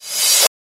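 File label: reversed sound short high sharp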